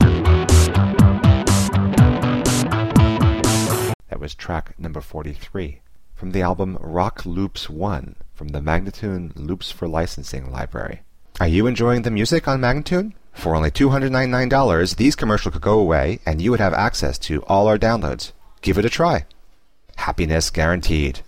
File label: 122 E, Rock Loops; Loops For Licensing